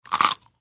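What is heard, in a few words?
Single Crunch High End, crispy snack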